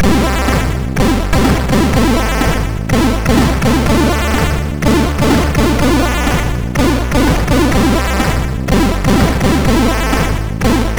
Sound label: Unclean Fanfare, sid, MOS6581R2